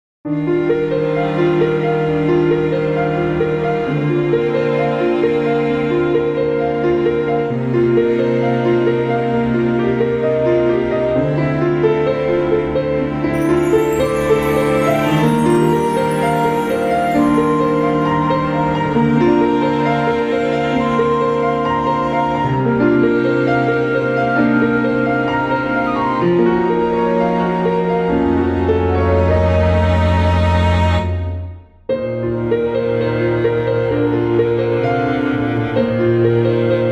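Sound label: Piano, B flat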